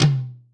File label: hi tom drum one shot c key